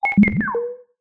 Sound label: science fiction computer console beep button or response